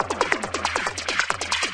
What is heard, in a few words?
138 bpm, invader clicks, drum loops and breaks